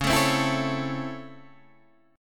Db Minor Major 7th Sharp 5th Db down Guitar Half Step Down